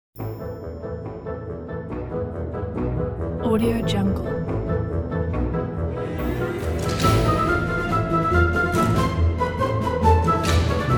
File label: funny chase fast motion music